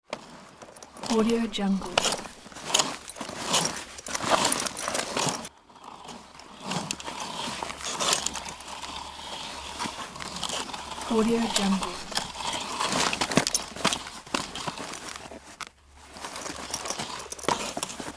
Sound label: roller blading skater point of view